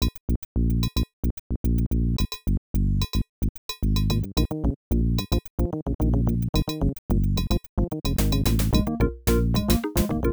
Rock; the incredible machine; ibm pc at

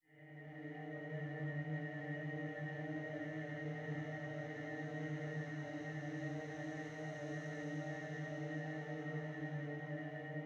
Tension Loop; City Music

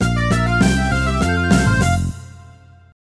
Level Clear

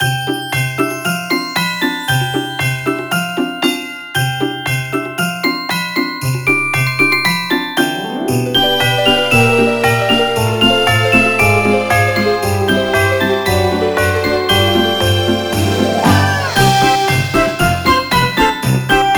Snowy Woods; 함박눈 내리는 숲속; Cookie Run OvenBreak

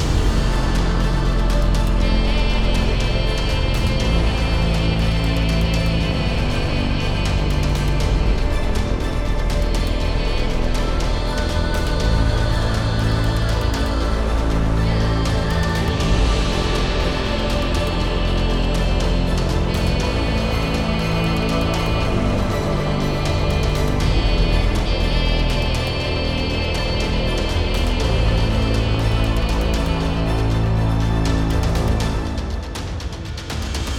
Fighting Phase; Nebulous Fleet Command

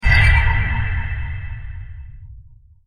hologram appear; Might and Mayhem

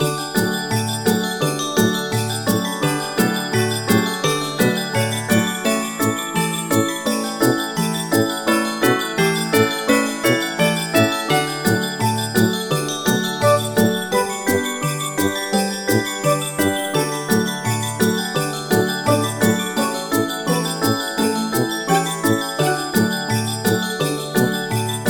Theme Park Upbeat; peppa pig world adventures

